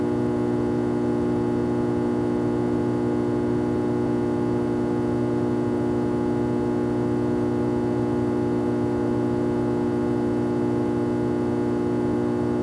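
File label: ambience fusebox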